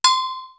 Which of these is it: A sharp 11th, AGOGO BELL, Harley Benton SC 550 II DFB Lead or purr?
AGOGO BELL